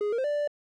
victory, 8 bit

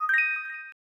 Retro Event Acute

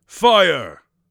fire, Mage Voice Pack

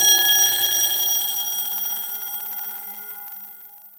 Phone ring; FREE SFX PACK VOL 1

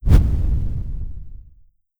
explosion; NIIIEMAND's explosion sfx

